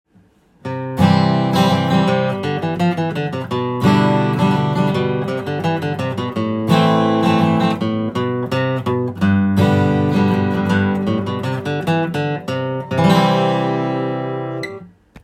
c major low, guitar

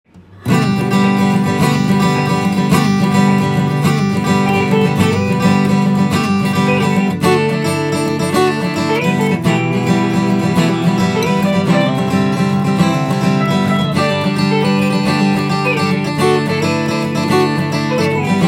country, guitar

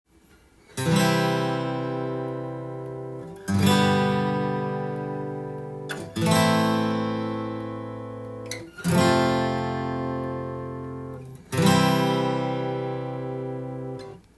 chord, guitar

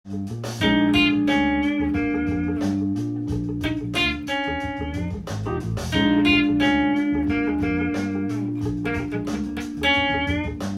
minor penta solo

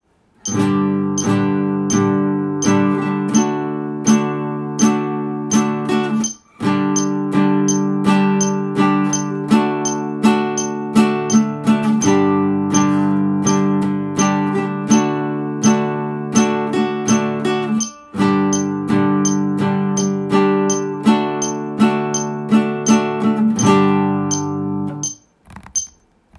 chord

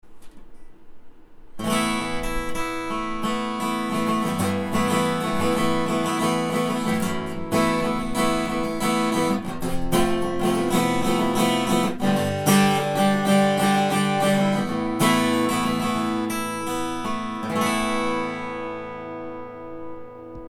yamaha l akogi

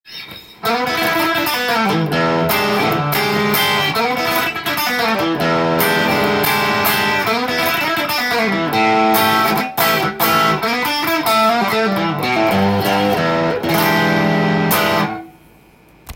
fender american standard, guitar; diy american standard fender 99

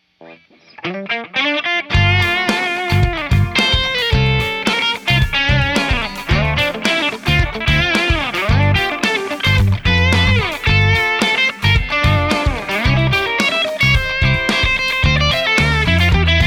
solo

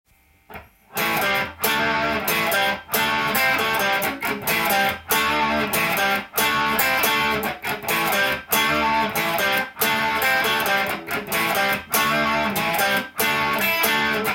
riff, guitar; how to create guitar riffs; musictheory

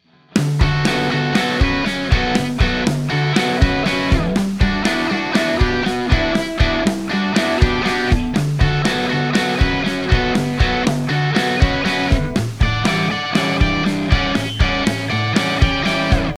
overdrive, guitar; cubase and universal audio

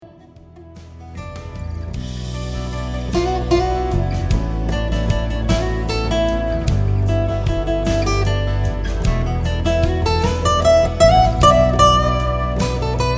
atmos solo, guitar

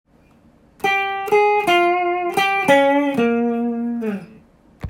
minor major penta, guitar

